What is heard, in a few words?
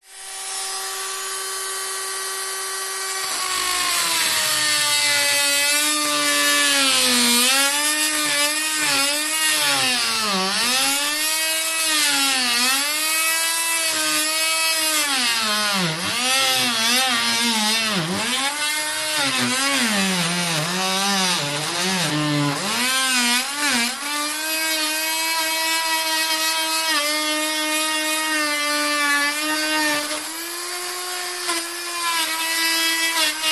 industrial power tool multi tool saw cut wood; zapsplat